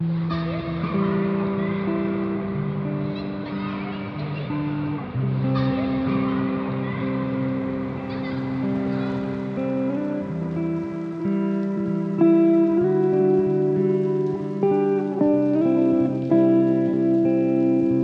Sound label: lofi